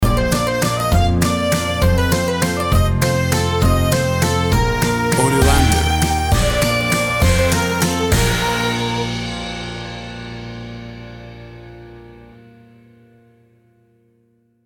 Toddler Music; The perfect piece of music for toddlers and babies playing. A cute and bubbly piece of kids musi

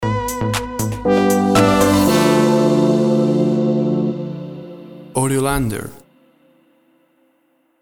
Tropical House Groove Stinger

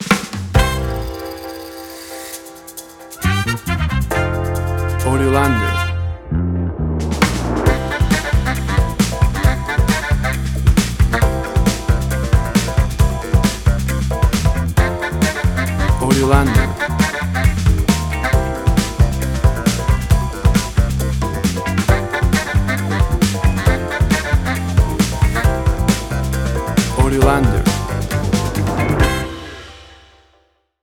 Brilliant Comedy

